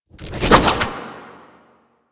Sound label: Sword Spin; Warframe; Duviri; Duviri Dax; Weapons